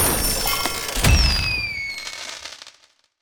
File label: money explode